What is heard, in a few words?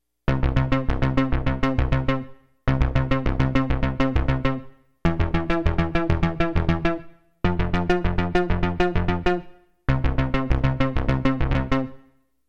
Synth bass; analogsynth; Penetrovisk; demos synth